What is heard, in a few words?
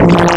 VOX SYNTH